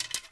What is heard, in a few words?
Arrow Quiver; Civ4 warlords